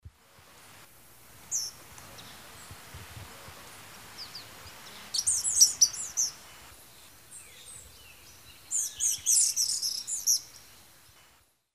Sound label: Empidonomus varius, calls pair; S. America; Eastern Lowlands